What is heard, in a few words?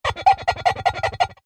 glass jug thick finger rub squeaks fast